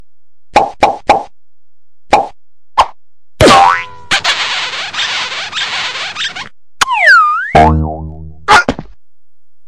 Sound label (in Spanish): beso; pucca; garu